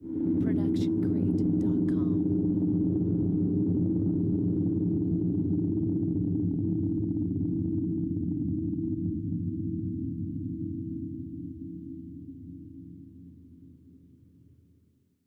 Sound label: Creepy Low Grumble; SoundsCrate